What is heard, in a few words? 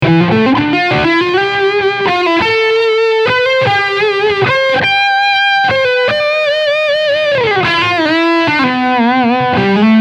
High Gain Lead